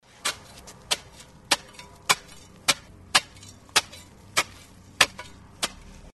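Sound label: sounds of shovel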